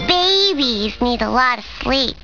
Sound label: baby sleep